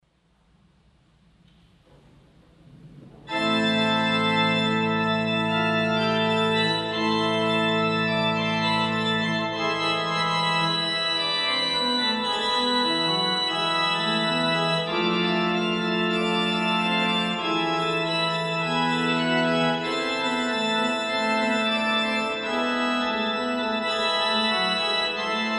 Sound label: Toccata; Sweenlinck